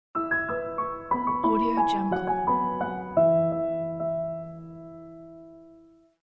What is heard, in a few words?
nice piano logo